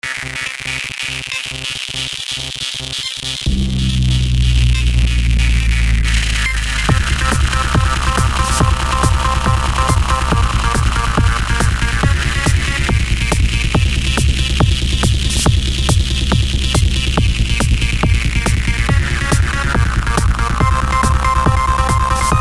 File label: electro dark